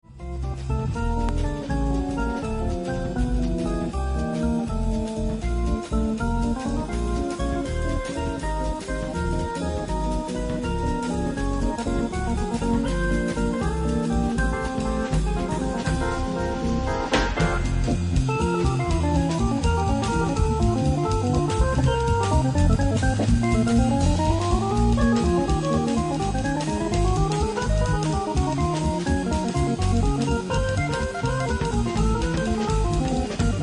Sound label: orgues Hammond